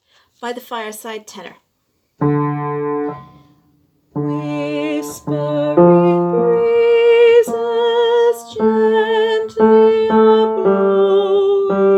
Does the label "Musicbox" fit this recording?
No